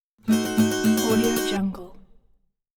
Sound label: high concerned fast acoustic guitar chord accent; hollywoodedge